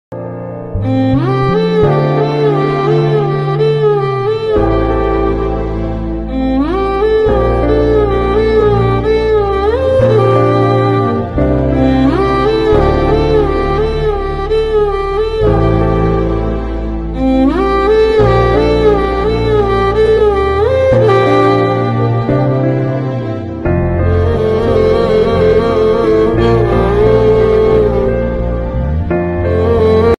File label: emotional bgm; nanis jersey